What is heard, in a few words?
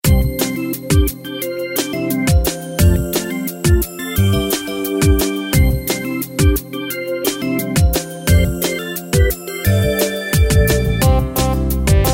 Level Music; Family Restaurant